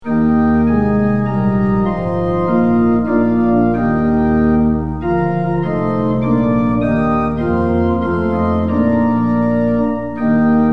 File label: tune; hymns; lillenas